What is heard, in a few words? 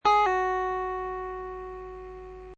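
pull off, guitar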